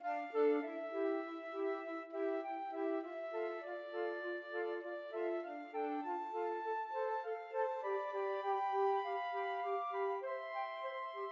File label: chabrier idylle, flute trio